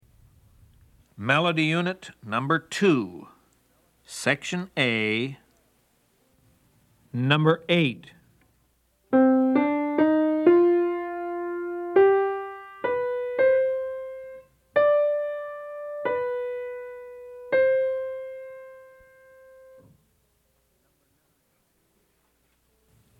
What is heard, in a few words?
melody, ear training recordings